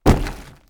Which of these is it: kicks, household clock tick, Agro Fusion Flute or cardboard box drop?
cardboard box drop